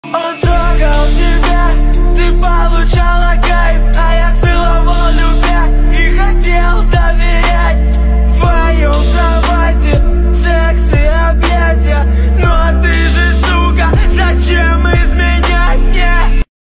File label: groove